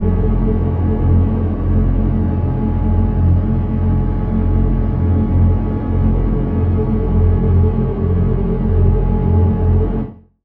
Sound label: drone cooper F